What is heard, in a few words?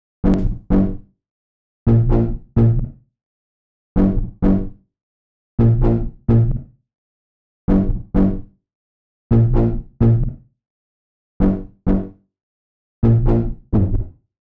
129 G Bass, Bpm129, Complex Electro Synths; Prime Loops; Androids